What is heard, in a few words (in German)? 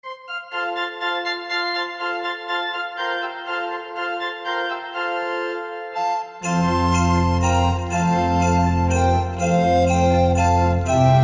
Macht hoch die Tuer, Floetenweihnacht